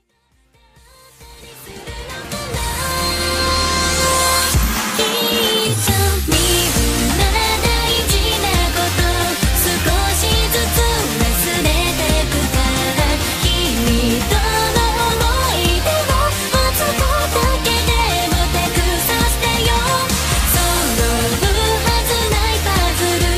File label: Click